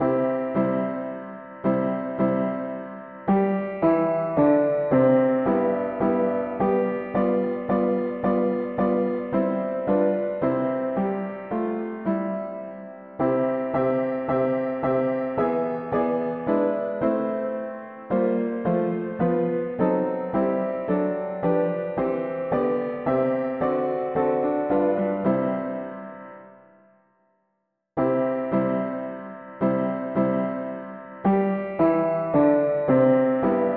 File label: mono piano; Jour apres jour l Esprit nous presse; hymns